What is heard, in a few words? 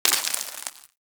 Bullet Impact Debris Dirt